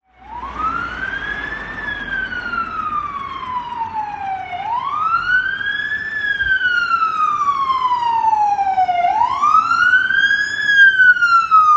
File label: ambulance ring ring ringtone